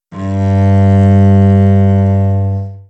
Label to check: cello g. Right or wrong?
Right